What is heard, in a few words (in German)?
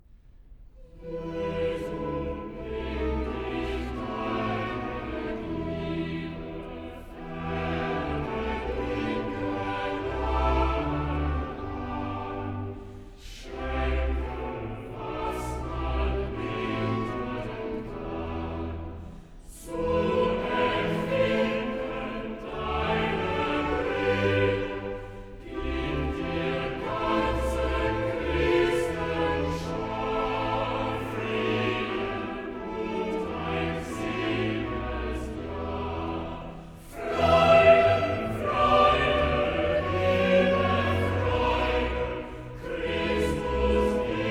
Choral; Cantata, BWV 40 'Dazu ist erschienen der Sohn Gottes'; VIII. Choral 'Jesu, nimm dich deiner Glie; Bach; New York; Xmas Day + 2nd Day of Xmas